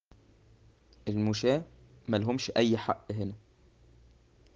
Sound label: traffic